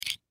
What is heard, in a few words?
Belt Metal Buckle, Belt Metal Buckle Slide Pin Right; Military Uniform; Foley; Blastwave